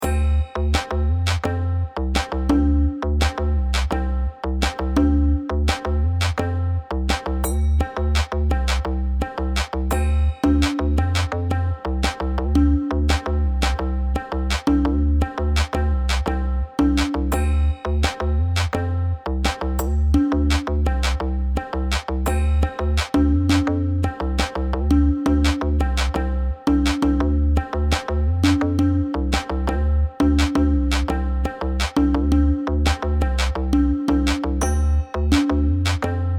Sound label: 7 8 polyrhythmic loop 85 bpm